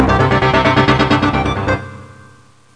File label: FUNKY